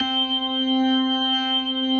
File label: C4S, B3